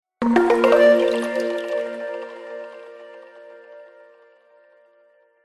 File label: multimedia game sound calm soft mellow watery positive